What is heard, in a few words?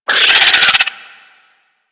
Infested Biped Attack; Warframe; Moa